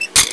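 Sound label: GATE CLOSE; HAUSHALT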